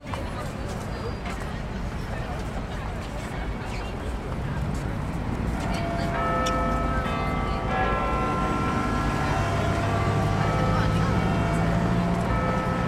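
ambience london big ben chime one o'clock traffic people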